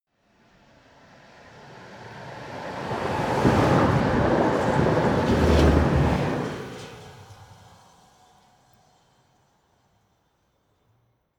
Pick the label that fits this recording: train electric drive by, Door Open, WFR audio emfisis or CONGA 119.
train electric drive by